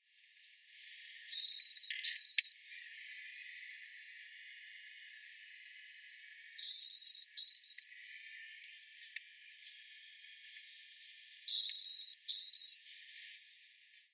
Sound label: Clay-colored Sparrow Songs